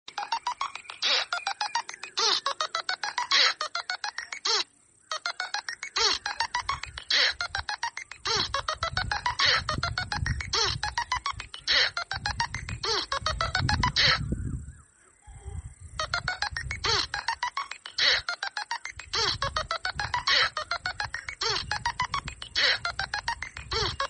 Machines Theme Ringtone; Nothing Phone 2

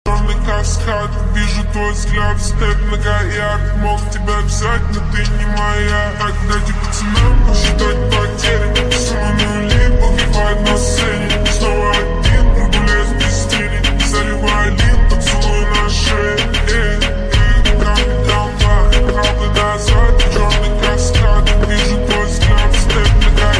Boys Attitude Music Ringtone

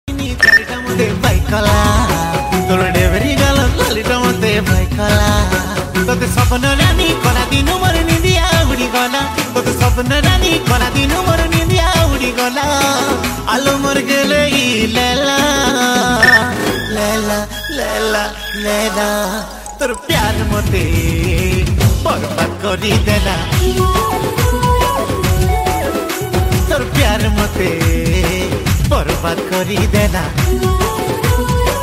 Odia Ringtone

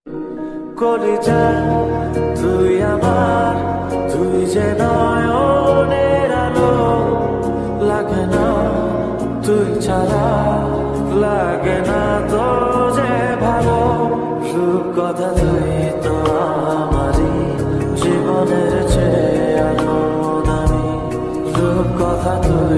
Bengali Ringtone

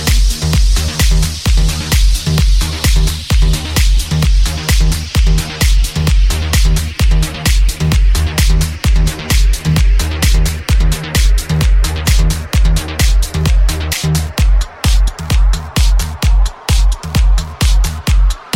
Ramp Walk Remix Music Ringtone; ringtones

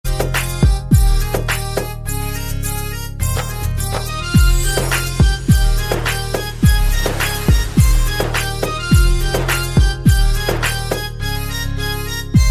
Music Ringtone

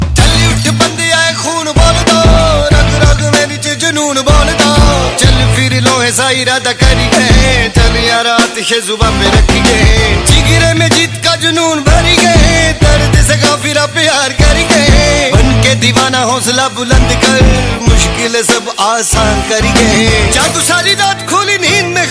Bollywood Song Ringtone

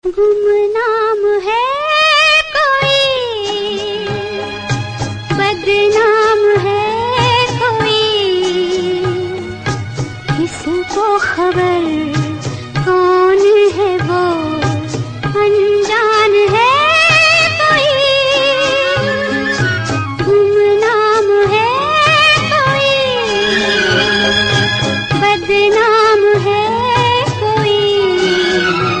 Female Ringtone

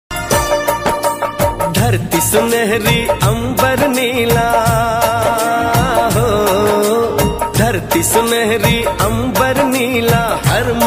Desh Bhakti Ringtone

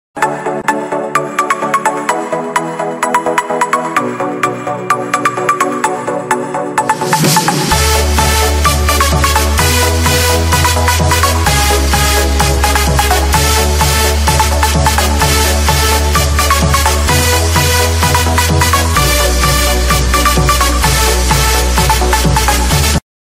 iPhone X Ringtone; ringtones

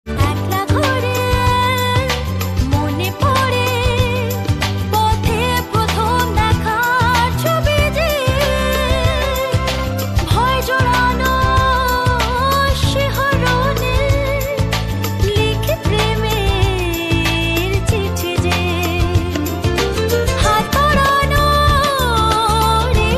Song, Bengali Ringtone

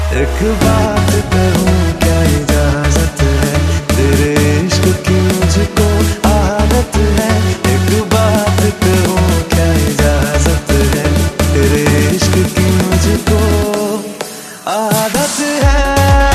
Bollywood Ringtone for Mobile

